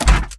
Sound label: Mvmt FS Walk Default; AI Stalker; Titanfall 2